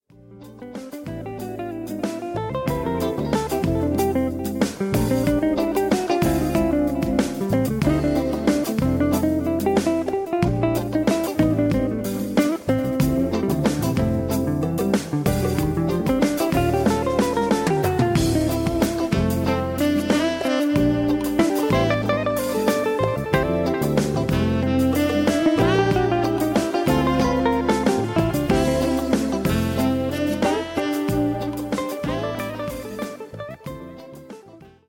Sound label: instrumental